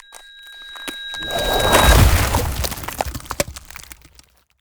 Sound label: Ice Spell Explosion Impact Arctic Avalanche; Ice Explosions & Impacts; Arctic Aura